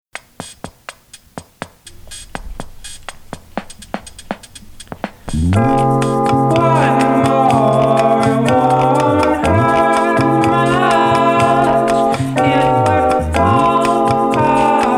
Conch; Sound Explosions

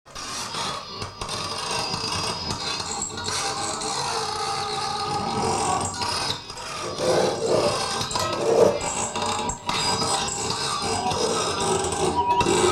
19-String Violin With Electronics

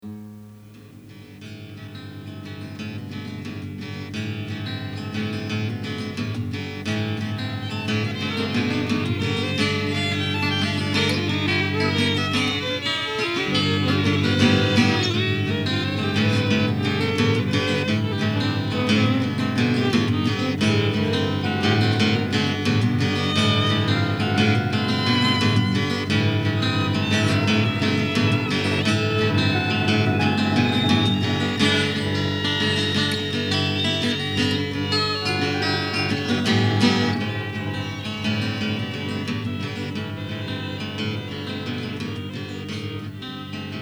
Organ jam

